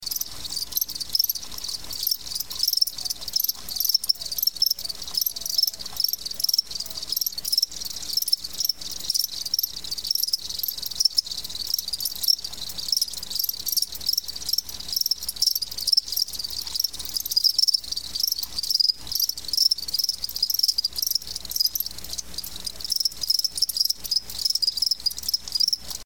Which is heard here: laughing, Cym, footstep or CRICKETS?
CRICKETS